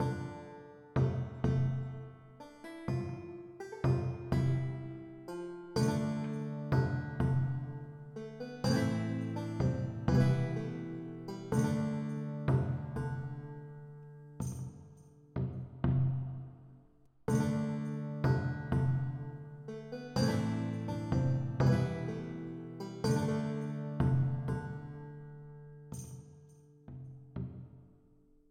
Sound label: Tavern Loop Slow